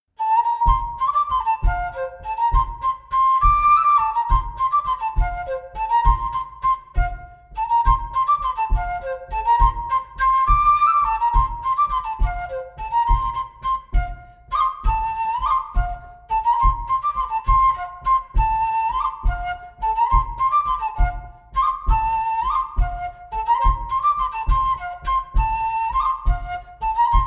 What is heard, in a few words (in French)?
bourree; Coatrieux